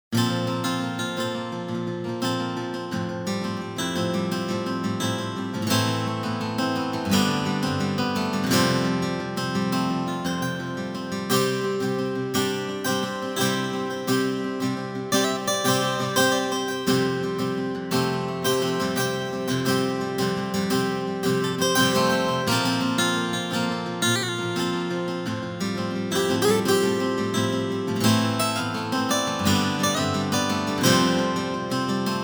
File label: guitars